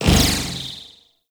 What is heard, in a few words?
Ricochet, Shotgun; Crp BE Arca Plasmor